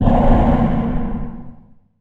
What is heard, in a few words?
tech fx; Best Service; Hyper Dance; EFFECTS